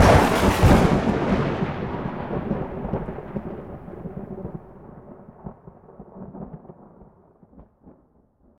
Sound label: Thunder